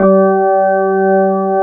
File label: ROCK B3 B, HAMMONDS; East West; Global Sounds